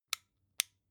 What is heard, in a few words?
flashlight on slow